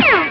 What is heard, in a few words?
Ricochet